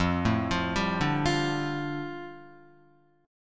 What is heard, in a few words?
F 6th Add 9th F down Guitar, chord